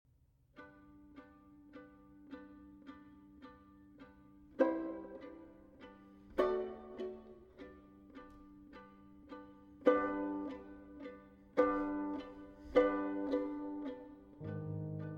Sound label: violin and piano, blues; Ravel Sonata For Violin and Piano; 2. Blues Moderato